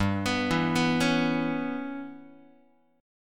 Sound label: G 6th Flat 5th, Guitar; G6b5 arpeggiated; JGuitar